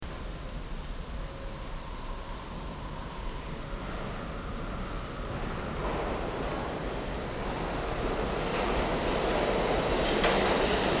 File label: Subway Trains; Subways (Sound Effects:Vehicles); This sound effect was recorded in a custom built sound booth